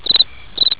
birds

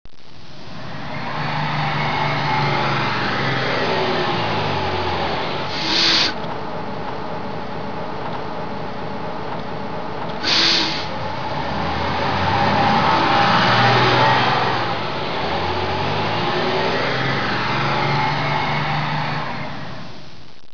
bus

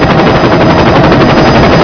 chopper

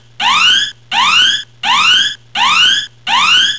alarm

